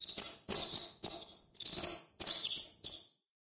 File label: Creature Sounds Spider; Spider 1; raycaster